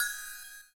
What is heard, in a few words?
CYM, Ethno Techno; ILIO - Ethno Techno